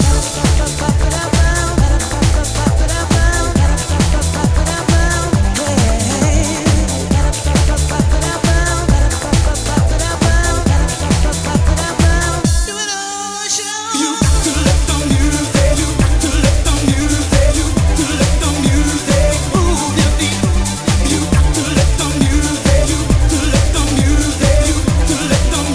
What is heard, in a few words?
cut loop